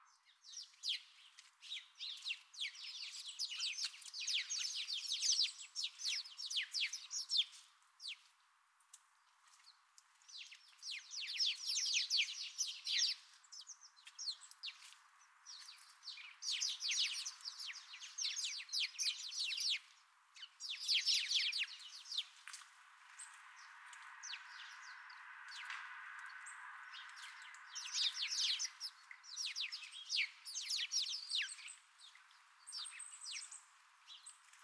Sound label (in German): Ruf Gesang Haussperling Passer domesticus